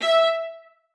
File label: MART E5, VIOLA SPICC; Xsample VOL-5; 90 s Sample CDs